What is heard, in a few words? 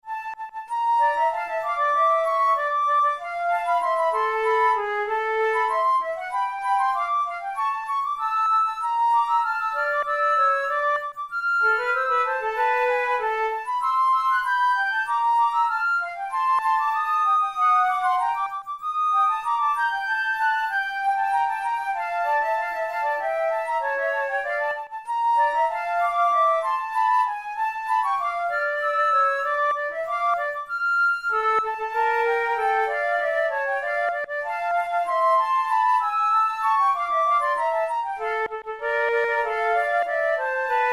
duet in d minor, flute; kohler op93 no2 duet no9